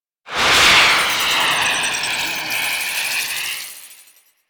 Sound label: Thermal Shock Ring; Warframe; Runner